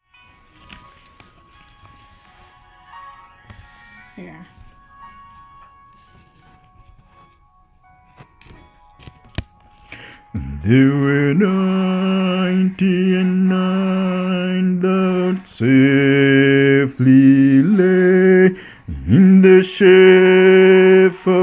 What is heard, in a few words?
Bass